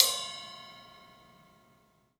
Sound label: 3D Percussives; E-MU Producer Series Vol. 5 – 3-D Audio Collection; P.A. Kit